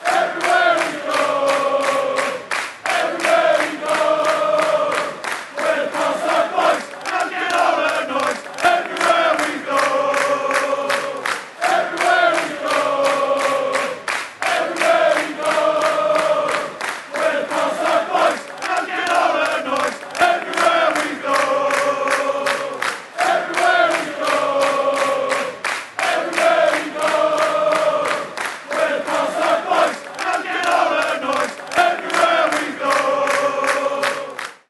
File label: barside boys colchester united, chants